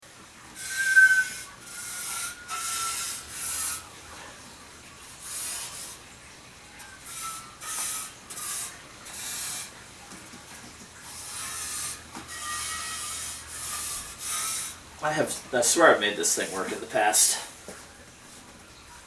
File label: Musical Saw